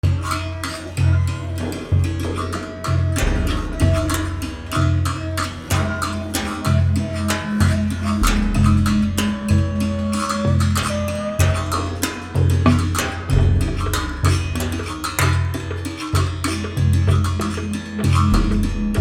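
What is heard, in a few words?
Battle Loop Rural Percussion; Fallout New Vegas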